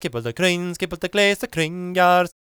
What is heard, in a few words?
GAELIC, World Voices; ILIO; Vocal Planet